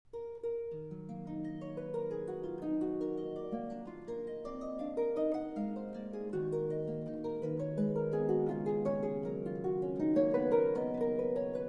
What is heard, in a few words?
Lute Suite in E Minor, BWV 996 II. Allemande; J.S. Bach